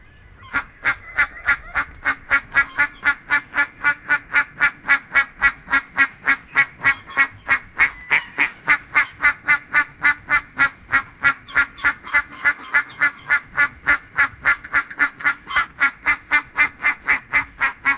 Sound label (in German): Enten quaken miteinander, duck